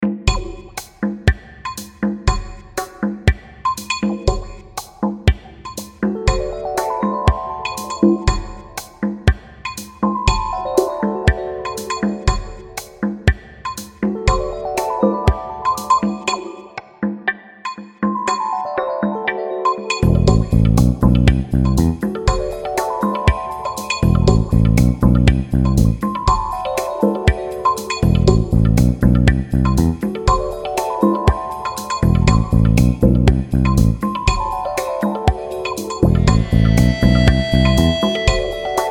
Wind Leaves Dub

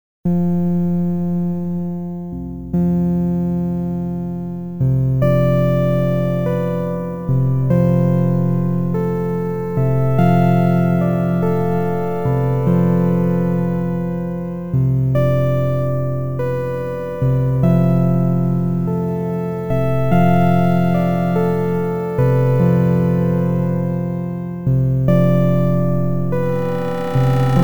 pizza sound, synthesizers

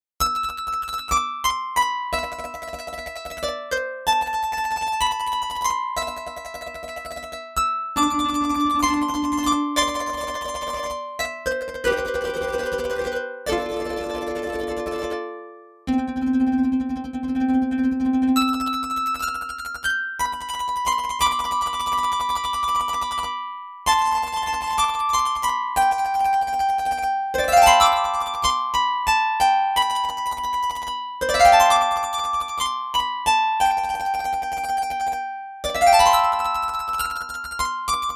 harptime free run, synthesizers

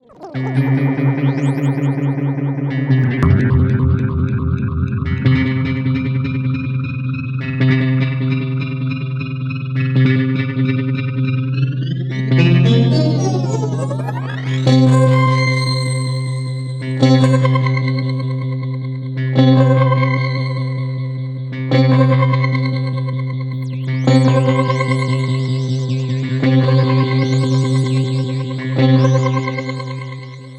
single synth note

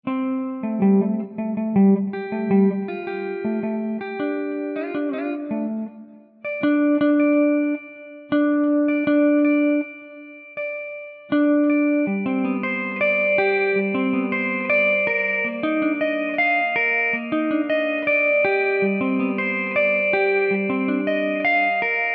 guitar clean

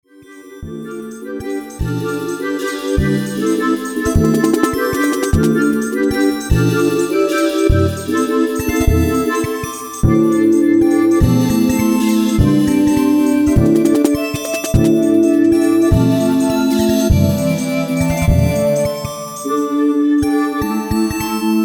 cymbals

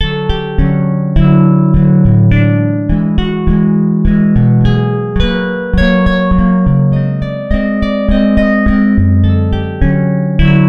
guitar enhanced loop; modelonia guitar

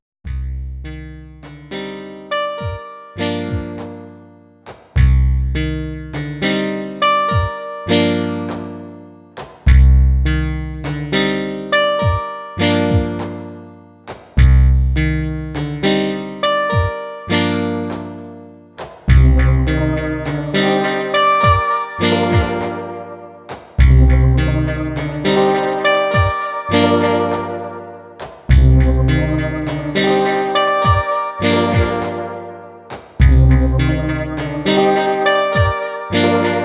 4 bars dry wet

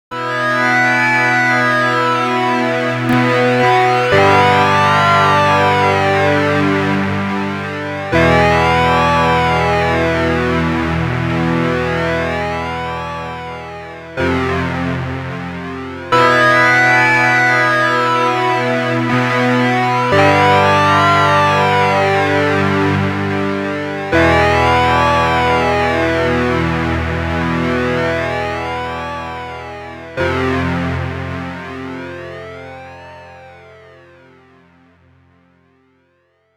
hardsync mod on FM oscillator

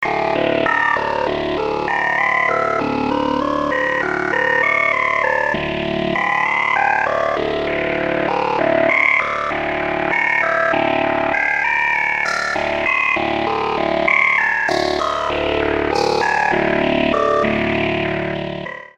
formantik harmonics, synthesizers

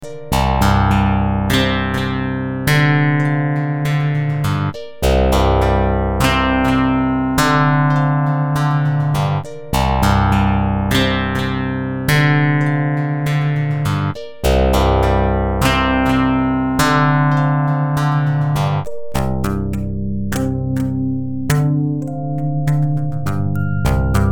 modeling loop, synthesizers

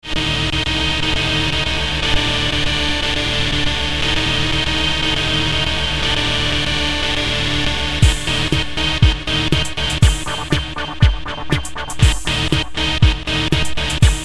synthesizers

